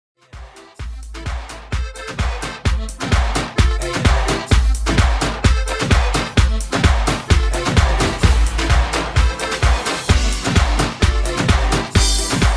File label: Latin Mix